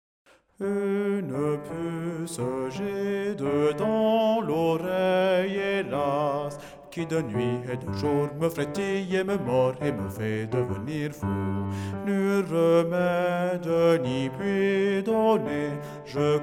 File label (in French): BASSE